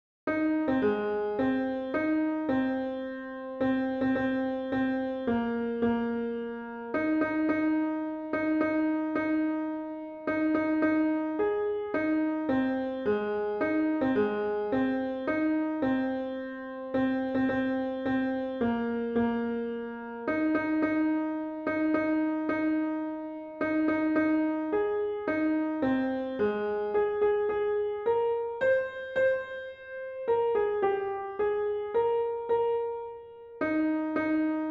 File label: star spangled banner alto